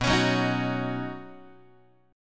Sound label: B Major Add 9th B Guitar; Badd9; strummed; JGuitar